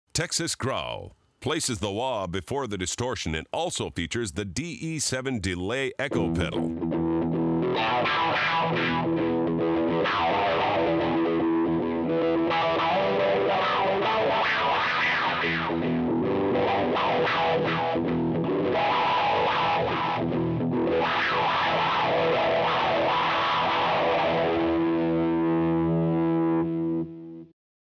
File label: Texas Growl